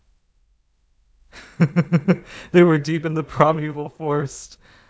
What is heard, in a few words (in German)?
lachen